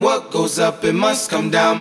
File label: what goes up it must come down SUNG